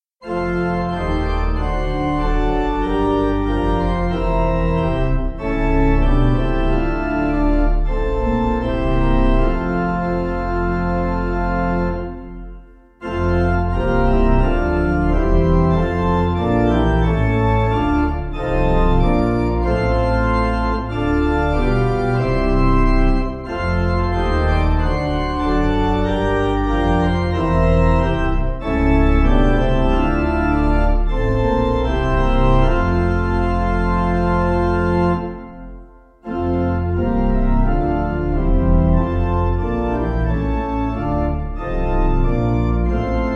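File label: The Angel Came, Pipe Organ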